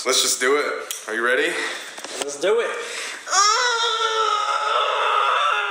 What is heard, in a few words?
Ahhhhgggh